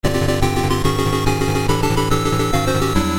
Success Music; Tron; arcade

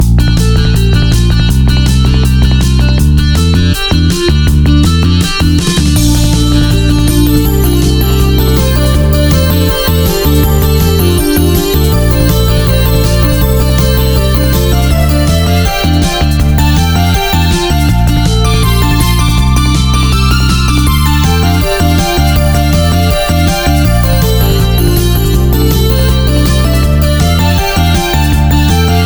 music ice

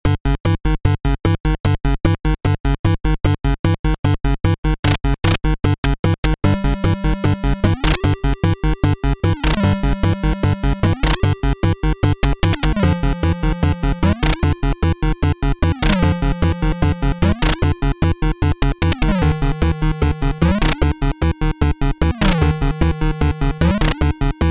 Dungeon; Space Hunter; NES

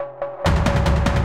Boss Combat Intro; Griftlands

